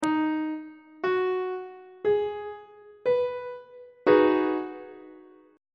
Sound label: c flat major 6th 1st inversion on treble clef